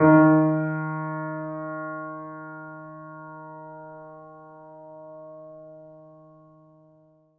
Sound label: piano p d#3, Grand Piano; Sonatina Symphonic Orchestra